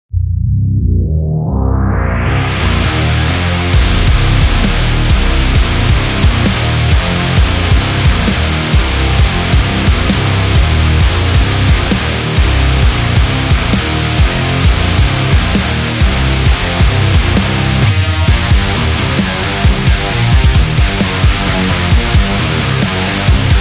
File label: In Metal